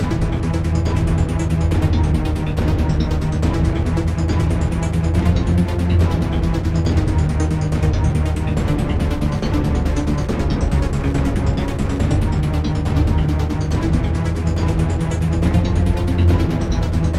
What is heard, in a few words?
ringtone; mathbot